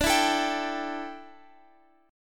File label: D Suspended 2nd Suspended 4th D, down, Guitar Open F; strummed